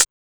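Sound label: HH, hi-hat; X-Static Goldmine 1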